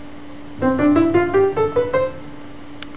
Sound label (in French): Gamme Piano